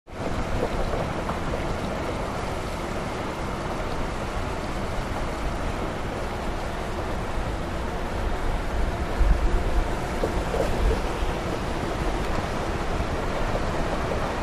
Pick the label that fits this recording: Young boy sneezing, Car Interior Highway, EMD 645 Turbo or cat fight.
Car Interior Highway